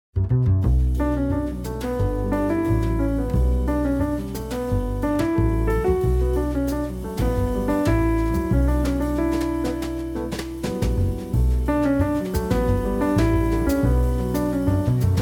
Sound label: piccolo